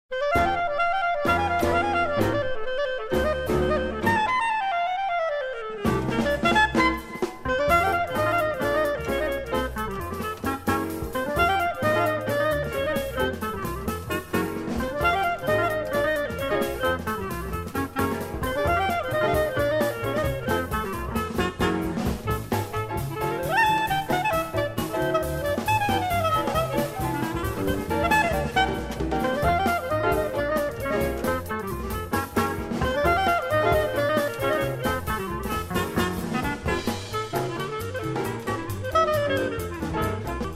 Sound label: Clarinet